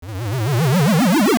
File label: Appear; Genie; Genie appearing and disappearing into his bottle (Mad Batter also uses the "Appear" sound)